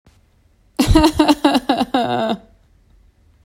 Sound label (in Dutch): Zielig lachje met zelfmedelijden